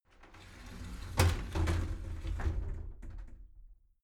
shower slide door inside close soft

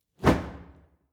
fire torch whoosh short; swooshes-swishes

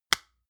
lotion large lid close hard; berufe; friseur

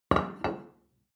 plate place table medium

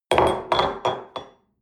espresso upset table hard, restaurant cafe

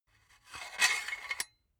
silicone gun empty pusher push slow; soundpool

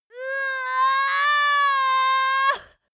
female cry pain; soundpool

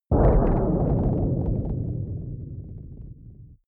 protective shield hit absorption rattle; energie

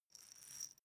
small glass pepper grinder turn slow

